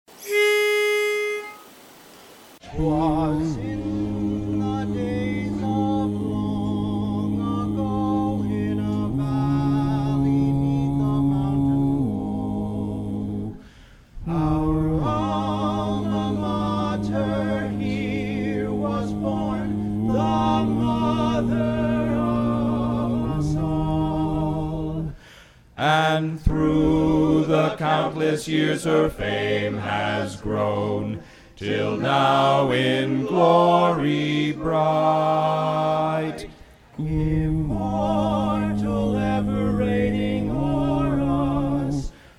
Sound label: bass part